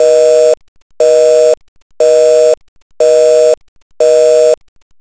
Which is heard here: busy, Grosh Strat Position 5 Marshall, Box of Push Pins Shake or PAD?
busy